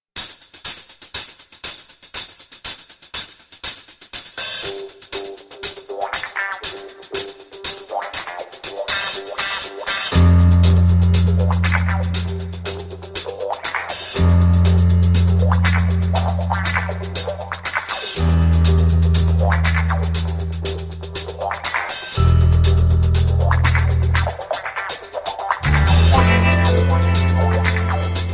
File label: instrumental ringtone